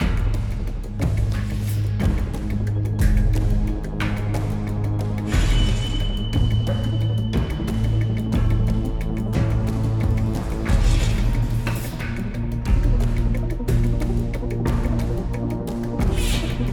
loop Action; the walking dead michonne 1 in too deep

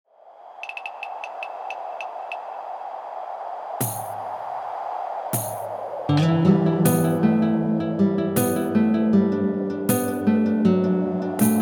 Sinister Mountain Tutti

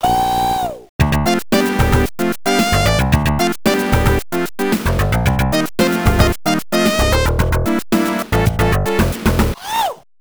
Dance Attack (with voice)

